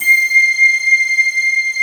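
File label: SQ1 metal, Synthesizers, synbrass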